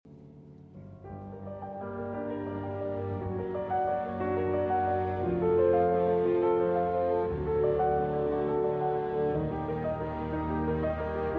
Piano Concerto; No. 3 Movement II

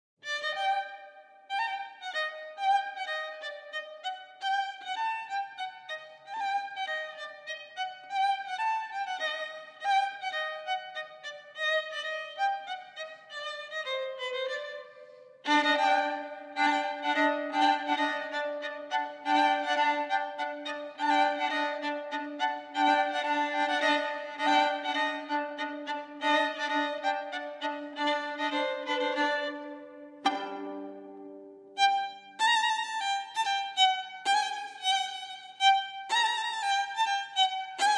Arr. For Violin; Gayane Uzundara; By Mikhail Fichtenholz

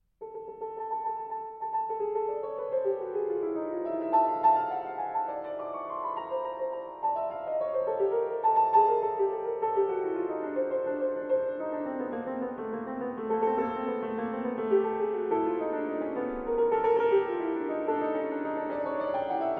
Sonata For Violin and Piano, Prestissimo

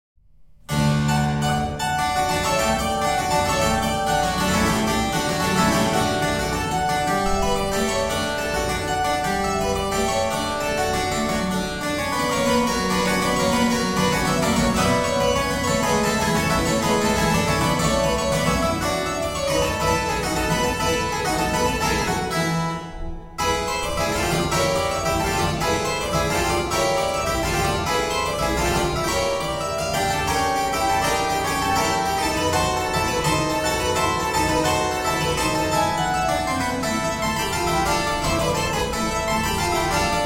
Keyboard Sonata in E Major (Allegro)

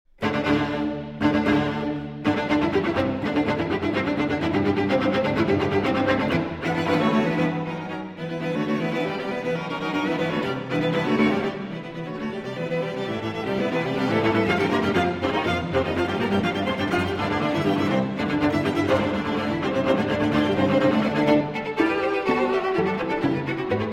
String Quartet Presto Con Fuoco; Schulhoff String Quartet No. 1 - I. Presto Con Fuoco

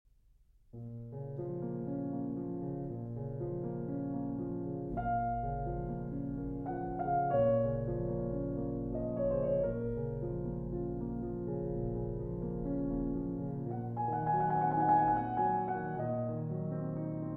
Adagio from Piano Concerto

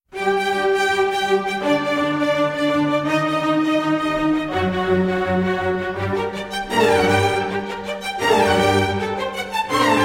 Symphony No. 25 in G Minor; Mozart Symphony No. 25 in G Minor, K. 183 - I. Allegro con br

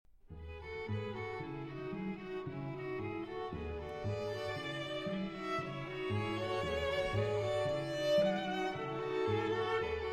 String Quartet Allegro Con Moto; Schulhoff String Quartet No. 1 - II. Allegro Con Moto

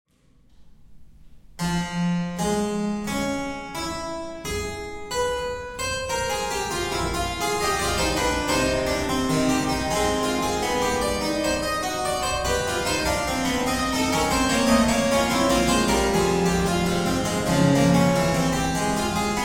Keyboard Sonata in G Minor, Moderato

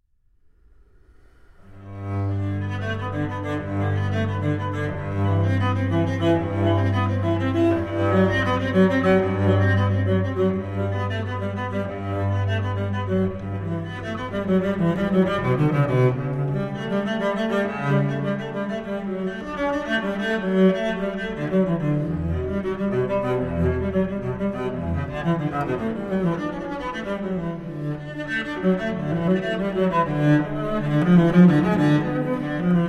Cello Suite No. 1 in G Major; BWV 1007 I. Prélude

